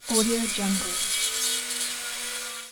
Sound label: game construction sound